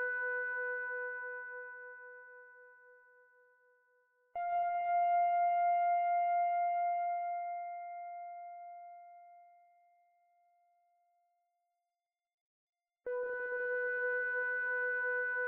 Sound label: stem lead, music loop; anvil